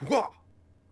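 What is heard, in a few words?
Swordsman Fort Vox; Civ4 beyond sword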